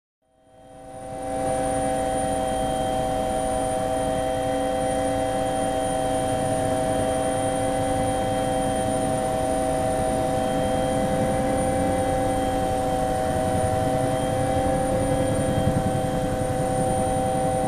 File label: Helicopter Engine Shutdown from cockpit